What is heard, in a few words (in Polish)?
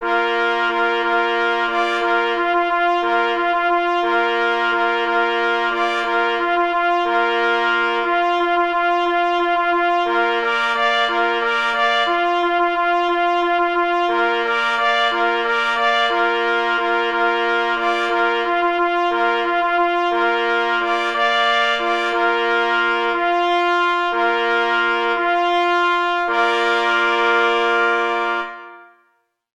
Hejnal na 3 plesy; F. Nowowiejski; Wyjazd na polowanie